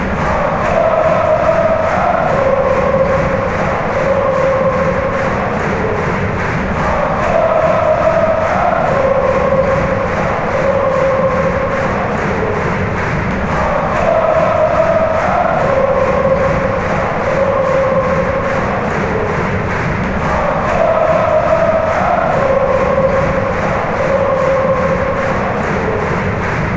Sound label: hamburg hambuurg